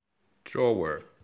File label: drawer wrong